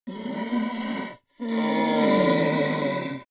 ogre grunt pain short; kreaturen

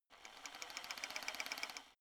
rock drill chisel settled short; schrauben drehen hydraulik

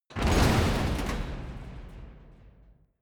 metal long; industrie wirtschaft

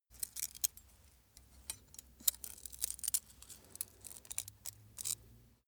small metal handling jingle long; industrie wirtschaft; metall

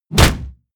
hit shield wood; hits impacts

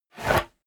small wood pickup; berufe; foerster

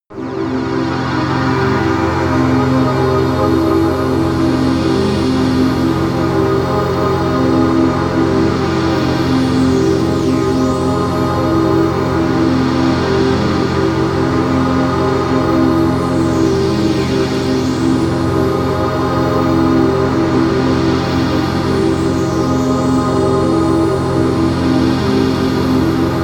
strange clouds, music elements

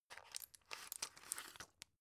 drug pull out soft; berufe; arzt

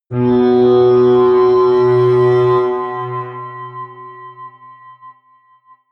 atmospheric feedback synthesizer low, drones

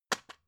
drug package drop; arzt

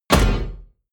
explosion metal clang dry, comedy cartoon; soundpool

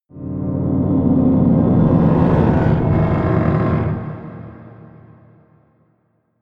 scrapes scary dark, drones

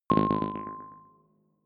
click code room heavy, signale

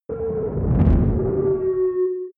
spaceship engine slow down; raumschiff

